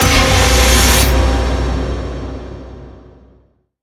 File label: bigwin; Hungry Shark; Wazdan